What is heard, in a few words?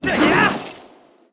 Ball And Chain Throw